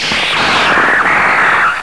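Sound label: sword pull; quake; fortress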